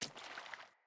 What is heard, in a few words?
Sandals Run Water, Footsteps